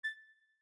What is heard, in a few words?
beep